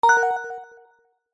game sound soft warm digital tone positive select

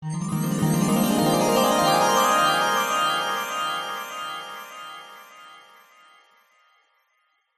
sound design magical rising twinkling nostalgic

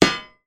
impact metal heavy thump clunk

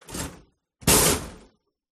Metal Bars Crashes Hits; sound spark

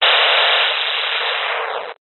science fiction radio signal interference burst

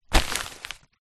parcel large padded envelope movement; foley

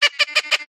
parrot lilac crowned amazon single call; animals birds; zapsplat

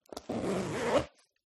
Backpack, Zippers, Tactical; PMSFX

